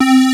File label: Synth Sound; Vengeance Samples; VEE Synth Shots